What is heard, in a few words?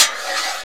METAL, INDUSTRIAL